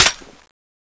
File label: clip out; tfa cso; burning aug